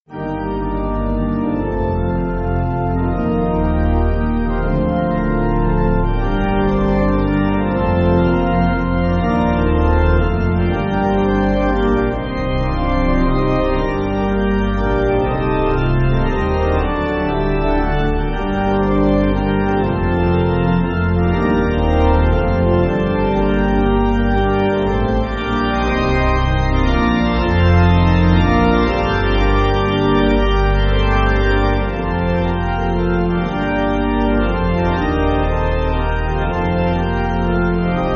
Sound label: Pipe